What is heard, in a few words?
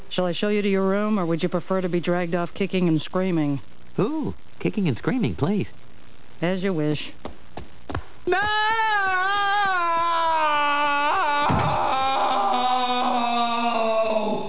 Kicking and screaming